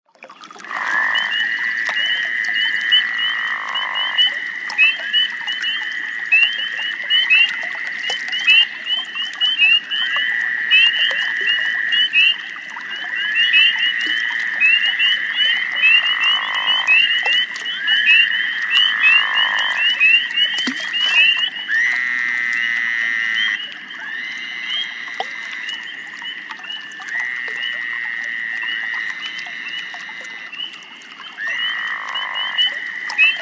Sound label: Swamp Dist CU Frog C